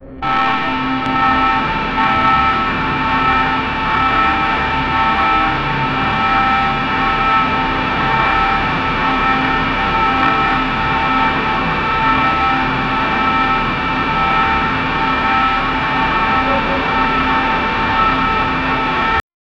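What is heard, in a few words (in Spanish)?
alarma postapocalíptica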